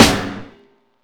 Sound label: snare